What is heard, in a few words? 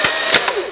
BAD eject; PUZMANIA